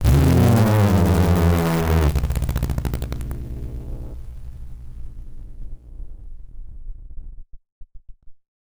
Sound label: random synth failure, malfunctioning synth